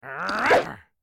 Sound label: Character Pirate Attack; Angry Birds Epic